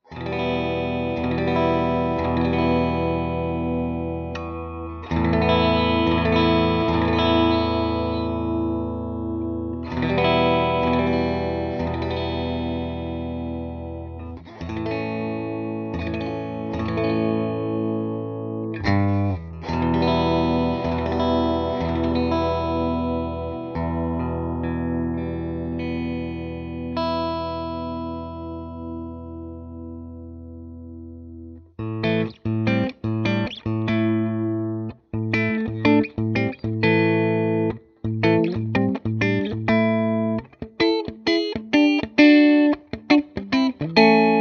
middle clean, pickups

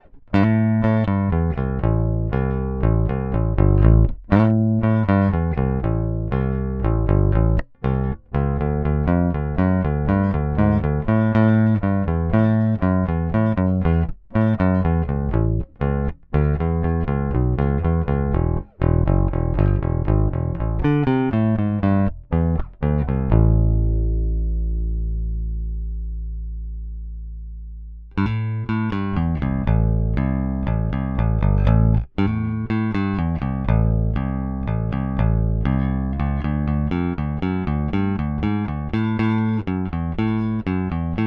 Rock Pic, JBass Linear

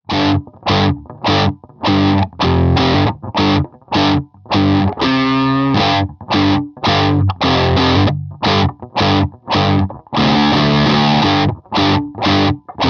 middle heavy, pickups

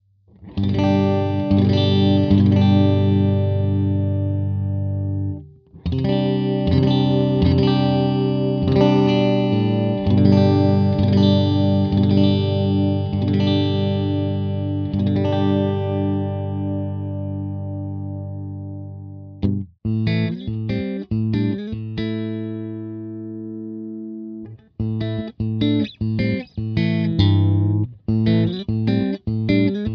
middle clean, pickups

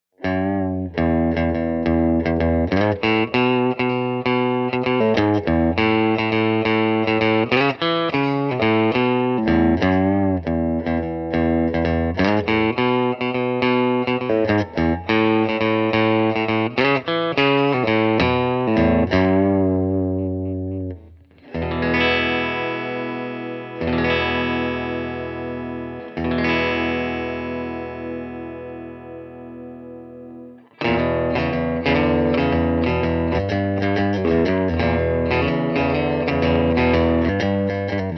bridge, custom pickups

